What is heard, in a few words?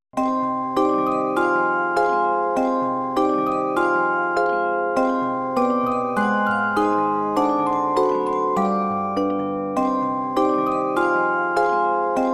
Music Box Version; Komkolzgrad; Syberia